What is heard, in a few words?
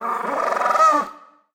pillar penguin; battle